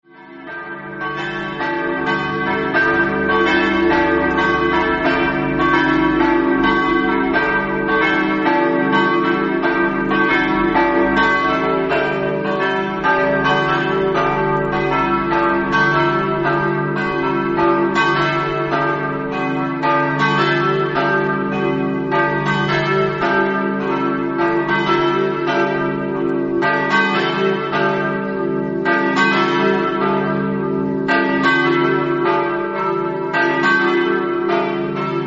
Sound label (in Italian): campane; sgiovanni